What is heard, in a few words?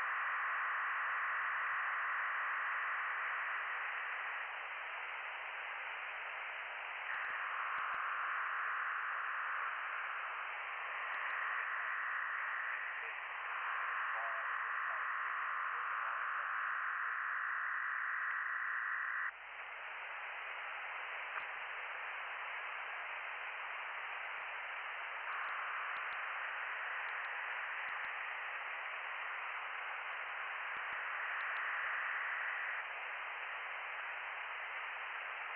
from radio, 7177KHz